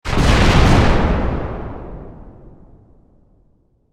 Explosion Heavy; SFX Bible 6

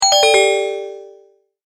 Multimedia Tone-Return/Back

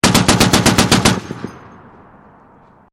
50cal burst, Machine Gun; .50 Caliber Machine Gun Burst; Machine Guns; VRSonic

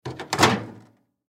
Hotel Room Microwave Open Door; Doors; Foley; Blastwave

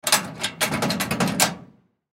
Gym Locker Door Locked Shake; Equipment; Sports; SoundEffects-Blastwave

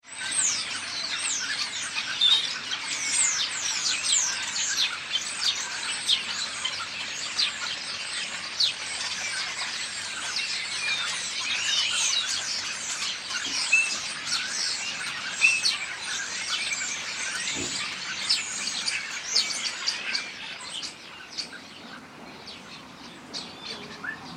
Flock Of Birds Startled; Bird Sound Effects; Andrew Fleming

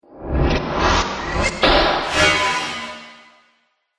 Starship Deploy Spy Probe; Spacecraft Sound Effects

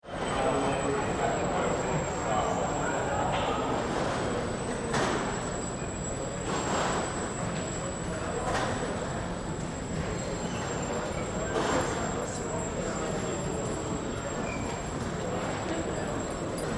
Train Subway Underground Station Ticket Hall; Background tannoy announcements - footsteps

